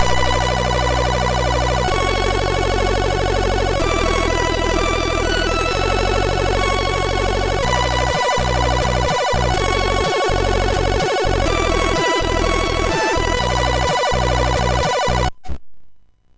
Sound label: sid MOS6581R2; Duotris